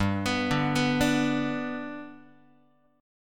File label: G Major down Guitar; G arpeggiated; JGuitar